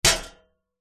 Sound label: medieval sword impact metal shield vibrate